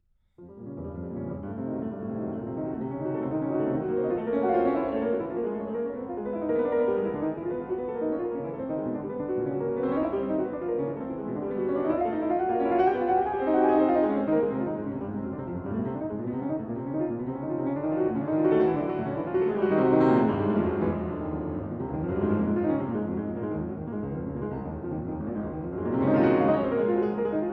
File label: piano sonata no 2 in b moll op 35 iv presto